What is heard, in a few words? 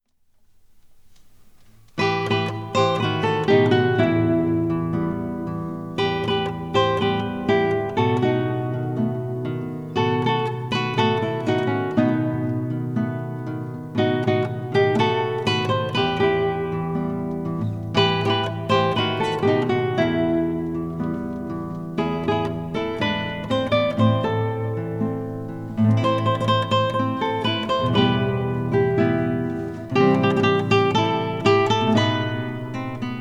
Tango